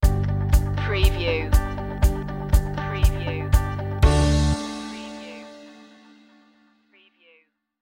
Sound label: all good people stinger